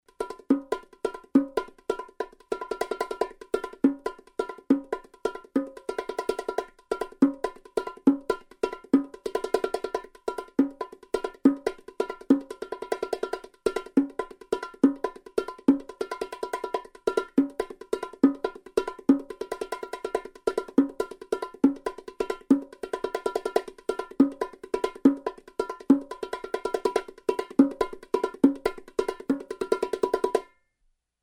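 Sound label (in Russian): ручной барабан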